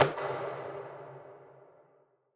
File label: PERC